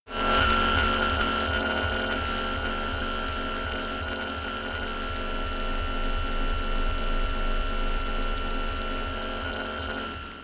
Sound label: noise scanner